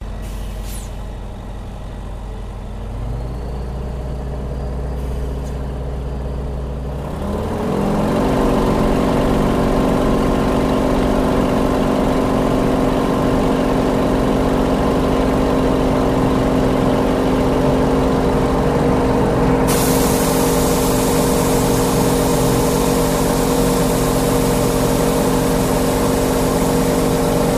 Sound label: Yak 52 propeller plane; stephan schutze